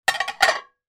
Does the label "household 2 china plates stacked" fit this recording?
Yes